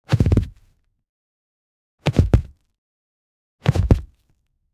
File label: cartoon slip slide, body fall; sound effect